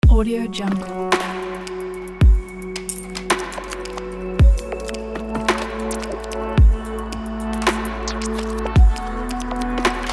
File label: digital cinematic glitch